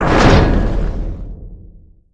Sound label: explosion; Explosions